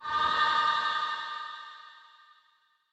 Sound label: Computer Death